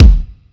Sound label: kick nya; osu